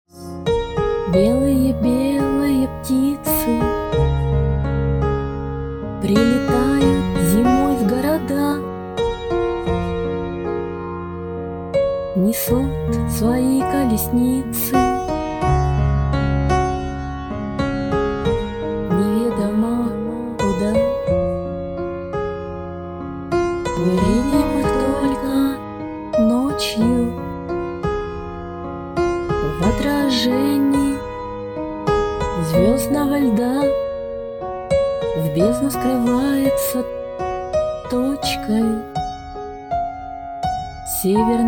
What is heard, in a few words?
piano